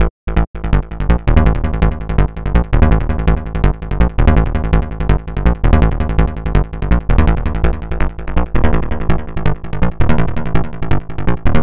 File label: Synth Bass